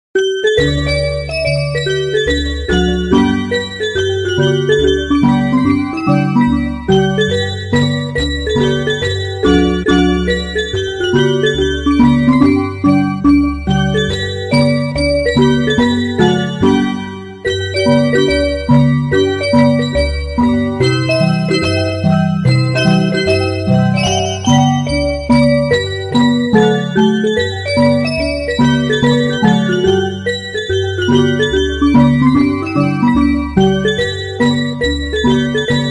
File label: Ice Cream Truck Theme Song